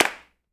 CLAP L; Big Fish Audio; Ross Garfield Drums VOL-2; ST LOUIS KIT

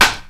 RIMSHOT, Ragga & Dub Reggae

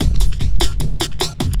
LOOP, Drum & Bass, 150 BPM

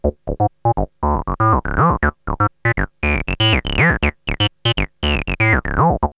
303 Loops; X-Static Goldmine

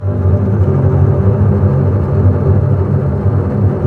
STR DB TRM, Symphony Orchestra, STR Cbs Tremolo; Roland LCDP08 Symphony Orchestra; STR Cbs Bow FX

